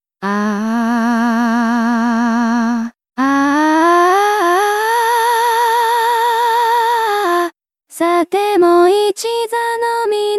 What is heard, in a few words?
vocal only